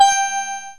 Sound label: STEEL DRUMS